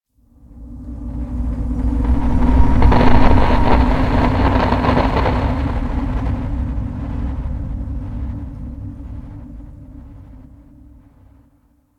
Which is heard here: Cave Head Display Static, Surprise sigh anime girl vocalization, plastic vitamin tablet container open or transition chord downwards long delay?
Cave Head Display Static